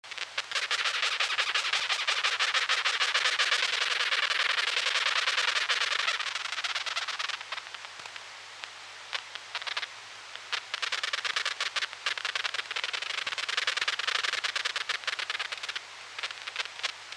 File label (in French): fourmi stridulation